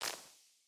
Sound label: ground ice crystal disintegrate; mine; battle